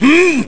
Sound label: Evil Hmm; Evil guy: "Hmm!"